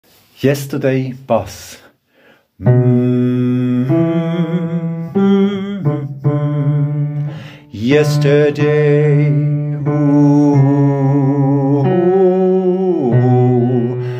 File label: Bass